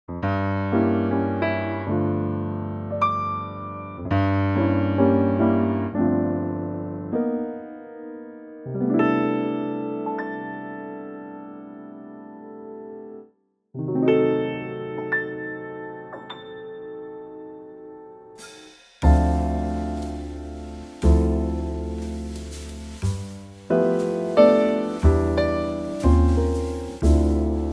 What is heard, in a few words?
C Trio